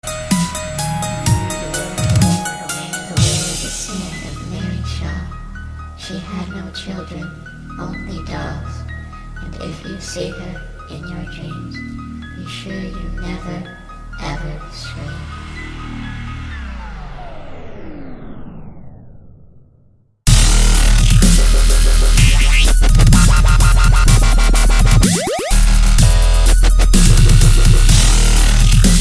halloween dub

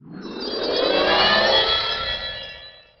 Buff Spell

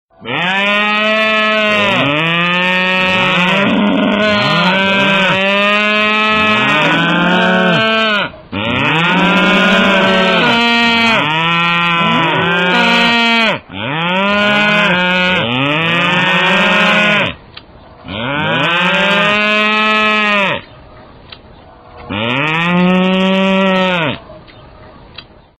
cow chatter cows

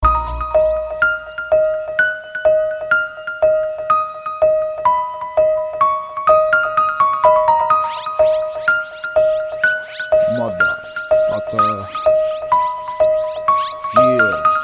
Piano

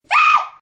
Screech

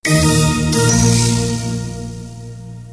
TV3 signal

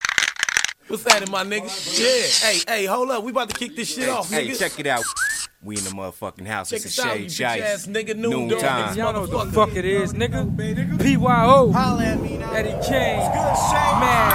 tone; gta

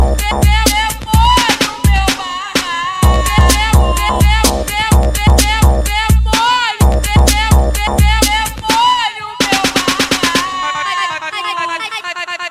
Cariocas Funk, ringtone

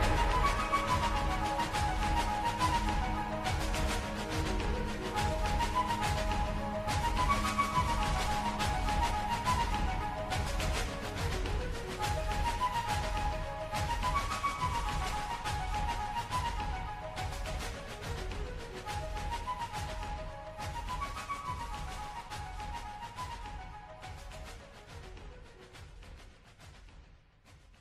Flute

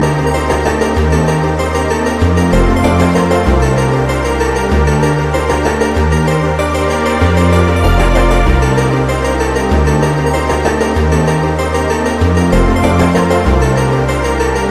Electro

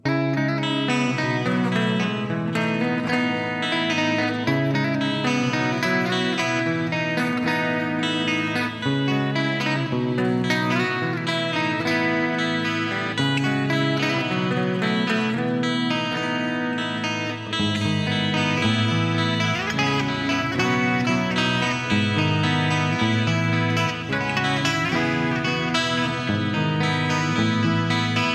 guitar

